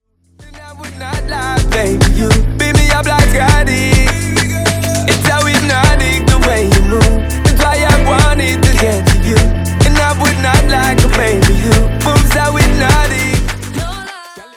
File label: POP